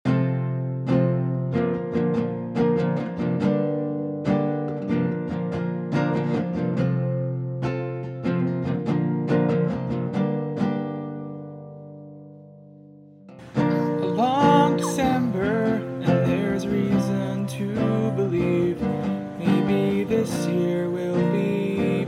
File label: scratch mix verse and chorus